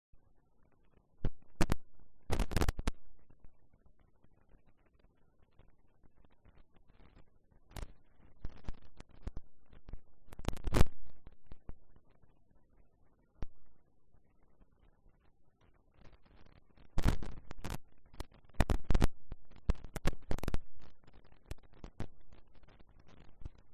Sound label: riverside; Kyoto U; Ashiu